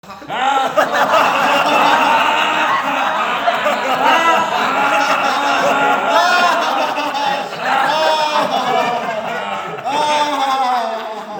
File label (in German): laute Lacher